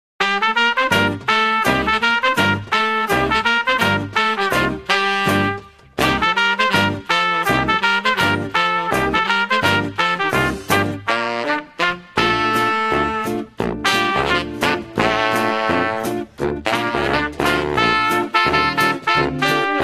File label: Bass Saxophon Bb